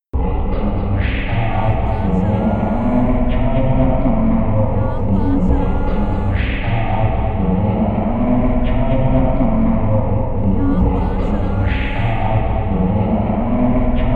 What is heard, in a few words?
RINGTONE